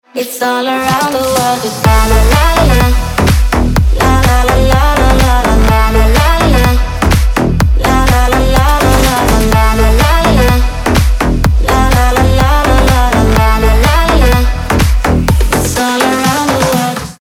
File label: BEAT